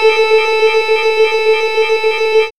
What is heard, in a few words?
SWIRL; X-Static Goldmine 1